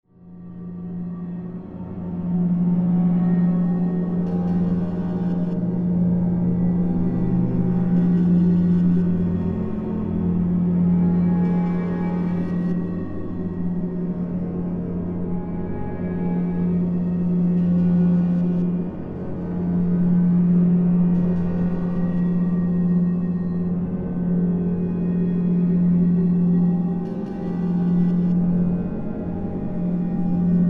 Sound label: Resembles Drone, drone tone